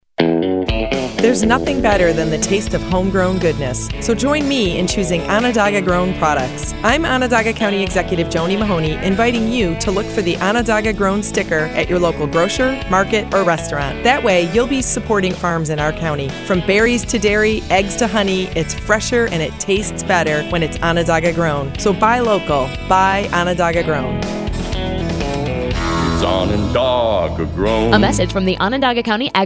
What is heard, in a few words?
JINGLE; ONONDAGA GROWN